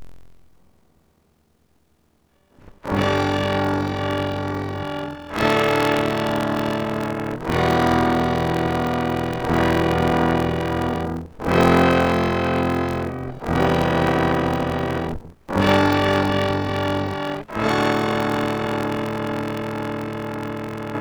open chords distort

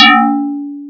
bounce ball

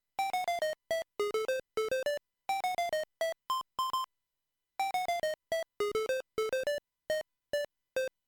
Pulse

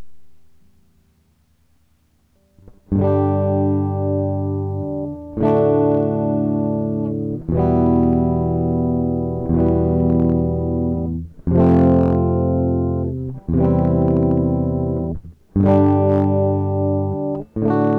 open chords

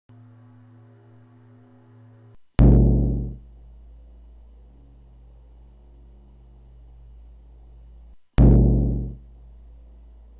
bass drum

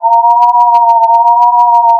distort; distort samples

